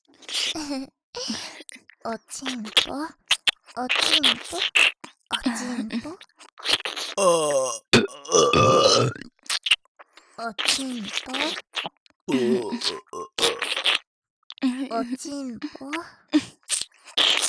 耳舐め×淫語ゲップオナサポ; オナサポパート; L耳舐めマリR淫語アリサ

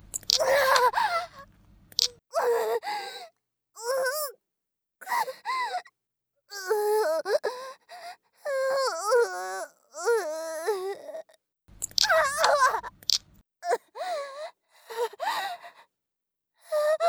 孵化出産; 効果音あり

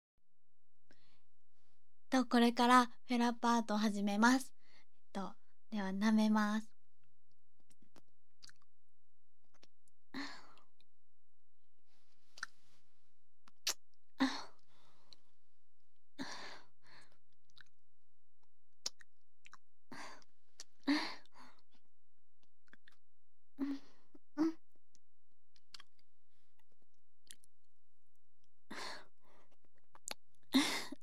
フェラチオ生録音; 視聴者へ

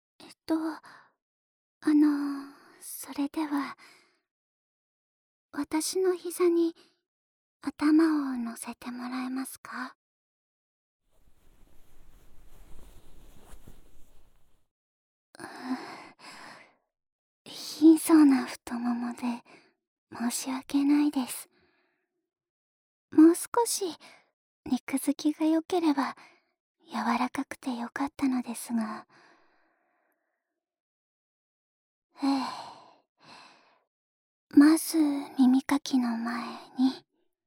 ASMR イヤー・クリーニング

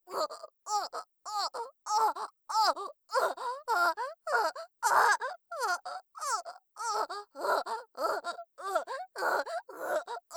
おぉ; 効果音なし

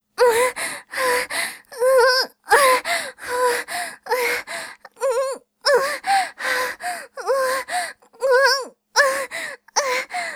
普通に喘ぐ, ボーイッシュ系 音声素材; Honey Drop

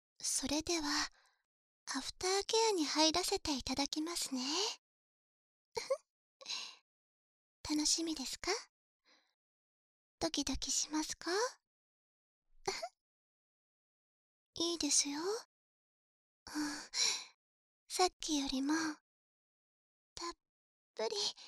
耳舐め 左; 基本サービス

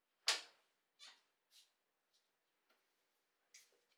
ドアを開ける; 動作系

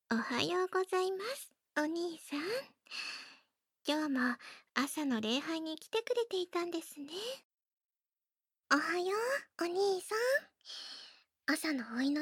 朝の祈り耳舐め; 変態な聖女たちに教会でこっそり性欲処理をしてもらうお話♪; ダチュラスクリプト; 日常の中の聖女たち

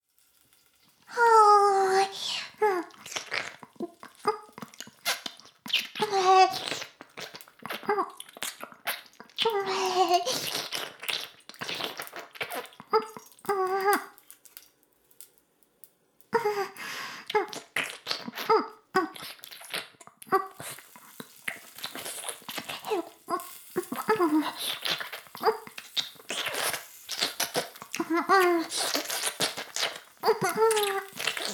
フェラ音声; 古き城のドラゴニート2

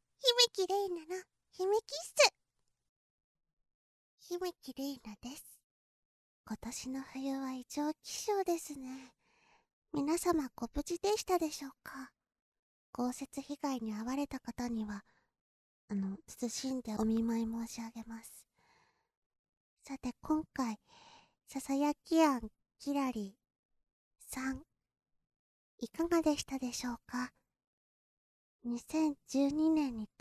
キッス！; 姫

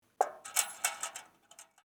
バケツを置く（取っ手が跳ねる）; 夏実と縁側花火デート

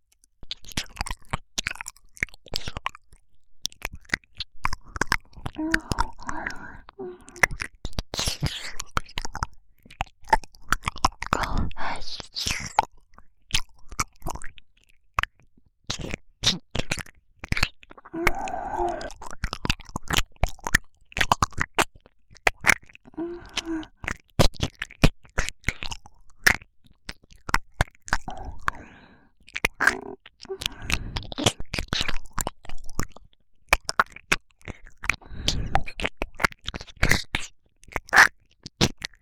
白銀の耳舐め

